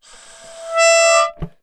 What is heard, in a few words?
Clusters, Melodica